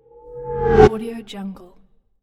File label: whoosh